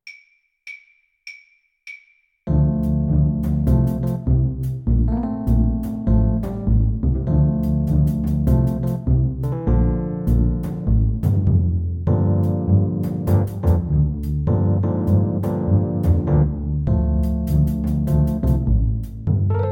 blues Flute

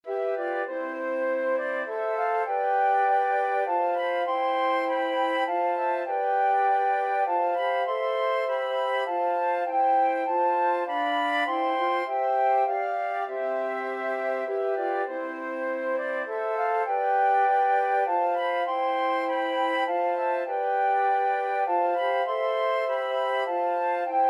first noel, flute quartet

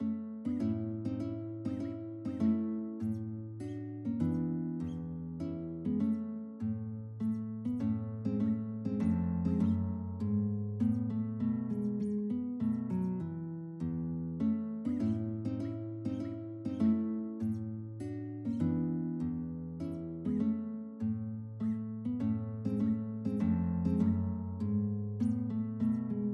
Minuet, guitar

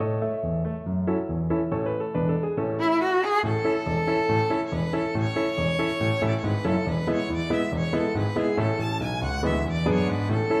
bulgar violin

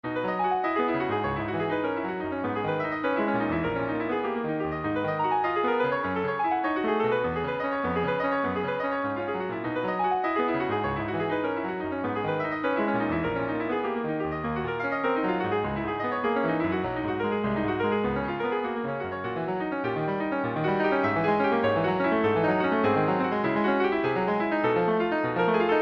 scriabin etude, piano

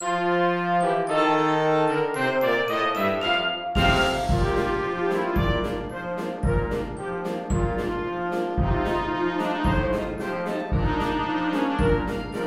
wind band; The Thunderer

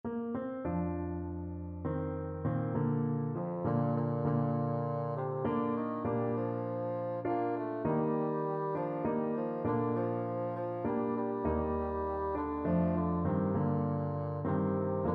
Shenandoah, bassoon

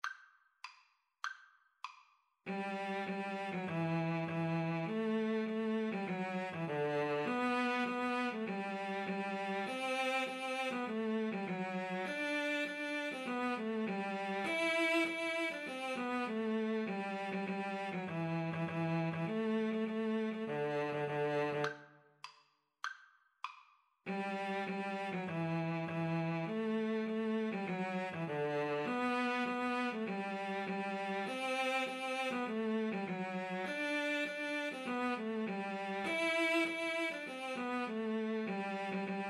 Cello Duet

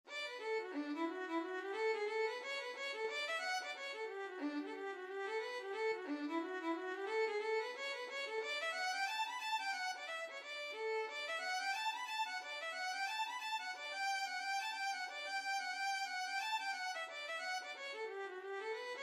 irish music, violin

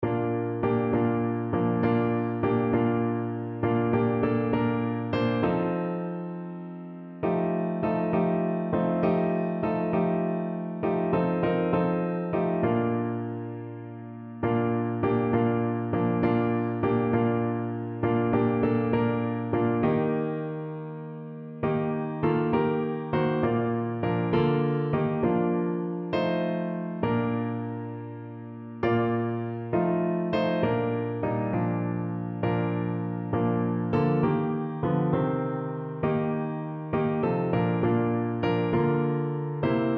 love lifted me, piano

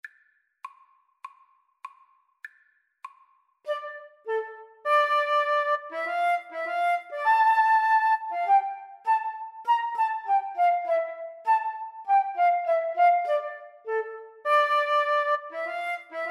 Flute duet

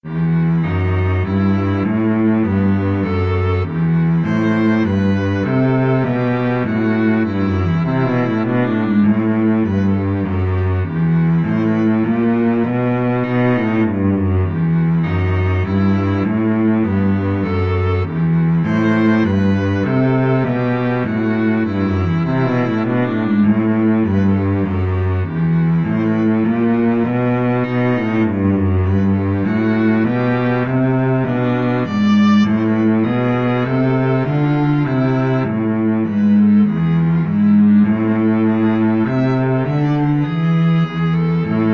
Minuet, viola cello duet; arne

